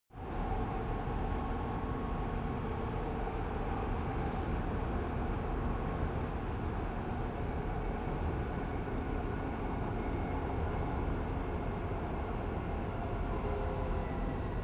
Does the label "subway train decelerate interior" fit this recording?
Yes